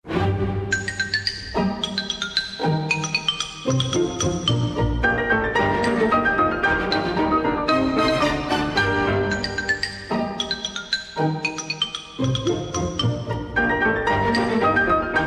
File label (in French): Les Fossiles instrumentale